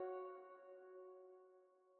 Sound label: 90 B Harp Tail, New Age Ambient Loops; Tail Samples; Spring